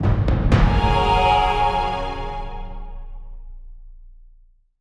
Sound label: fanfare; Reven Xbridge